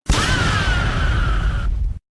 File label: fahhh pump sound